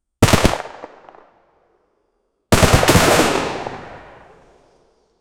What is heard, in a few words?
minigun, burst fire; a 10 thunderbird